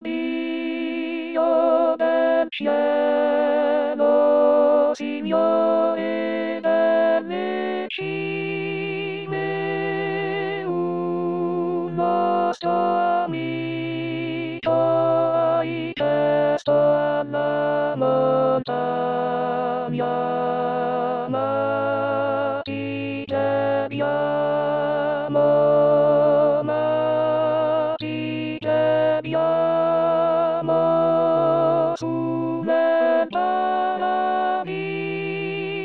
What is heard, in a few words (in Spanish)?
voz